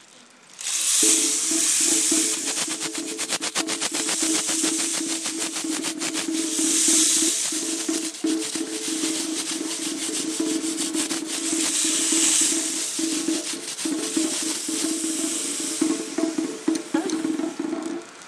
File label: thunder and rain